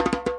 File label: djembe roll; ETHNIC and WORLD PERCUSSION